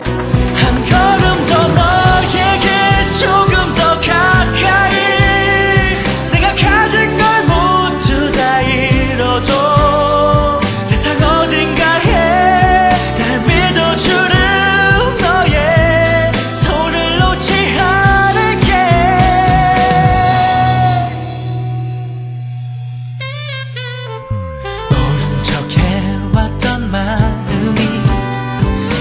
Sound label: chorus